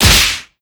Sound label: Ball Hit Drive; gamerip